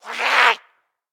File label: attack, zombie; DayZ Epoch